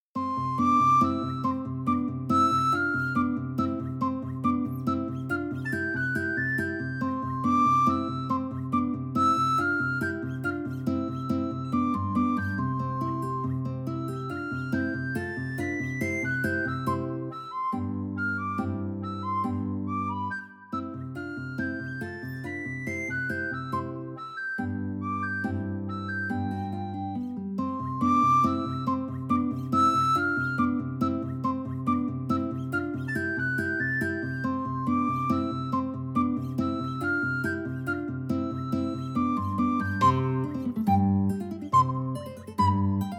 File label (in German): Klangbeispiel Gelinek Rondo Gitarre Sopran